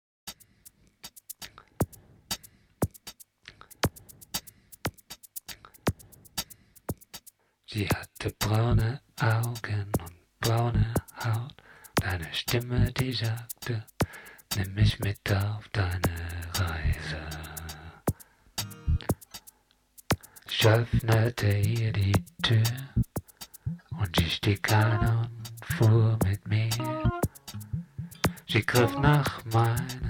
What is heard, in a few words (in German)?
rock oder pop